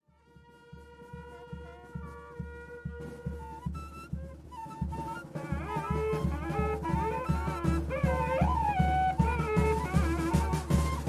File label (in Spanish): Toque Turumarinera